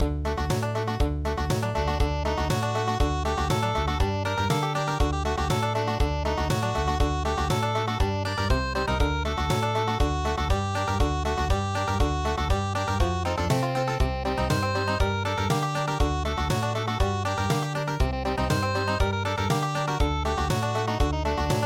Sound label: Country